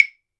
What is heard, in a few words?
clave; E-MU Producer Series Vol. 7 – Old World Instruments